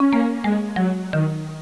downer music; Sound Effects for Multimedia - Volume 1

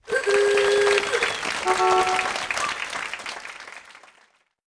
CHEER, petitioners

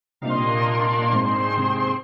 Error Stop; Fluorescence

